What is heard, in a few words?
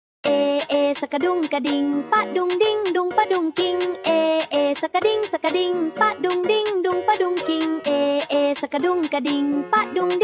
Funk slowed